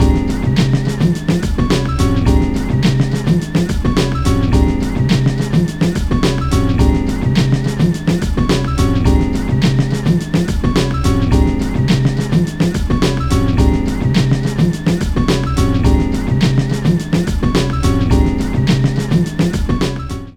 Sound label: Menu Loop; NBA Street; GC; PS2